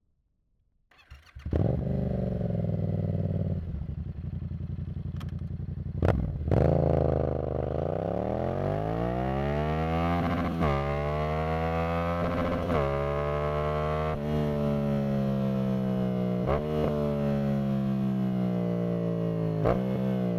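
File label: Yamaha MT07 Onboard Start Medium Drive Various Off Engine Right; 2015; PZM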